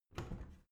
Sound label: washing machine modern open